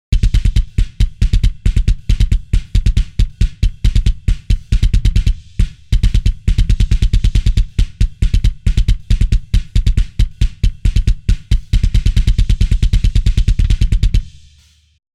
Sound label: Kick; GeminiPTE